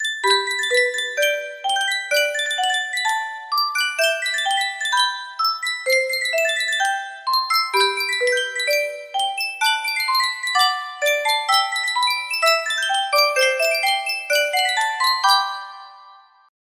yunsheng music box

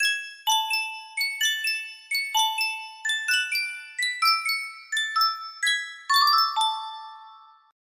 Sankyo Miniature Music Box, music box melody

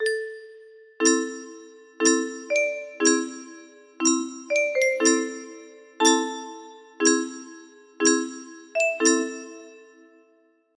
melody

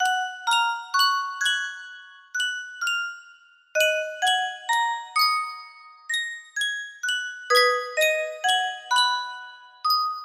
Yunsheng music box, music box melody

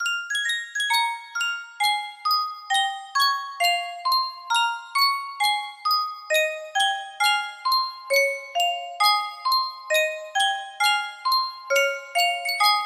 yunsheng music box

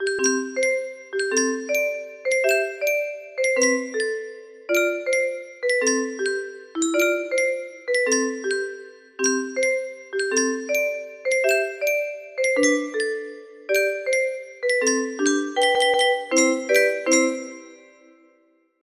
music box melody

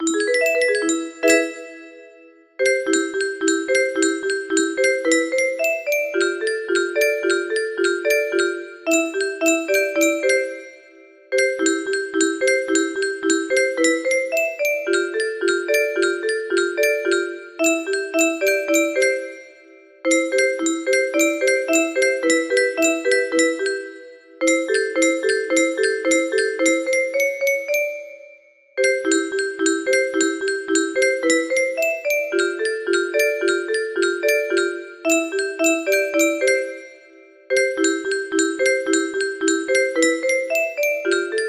organ test

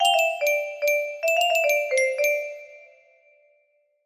mythical, music box melody

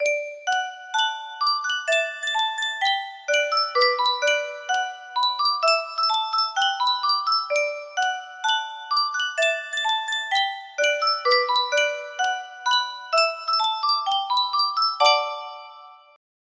music box, music box melody

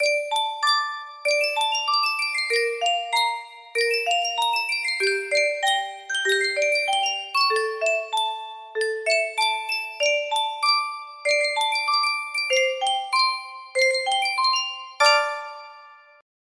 Yunsheng music box

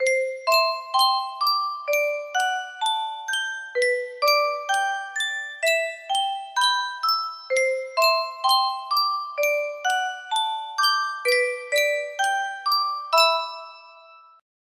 music box

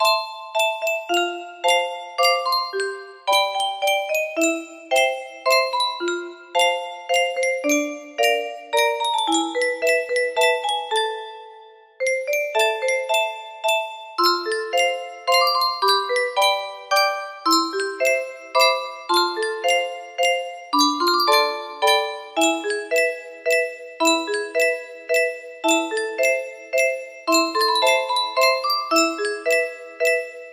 muro box, music box melody; muro box 40; lychee dragon cookie cookierun

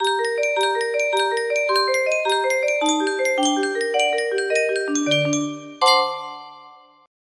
tune from valcom pa horn, music box melody

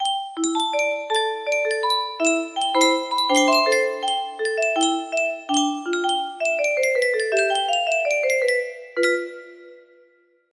melody

